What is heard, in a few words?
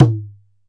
ROLAND SPD-20 PERC TABLA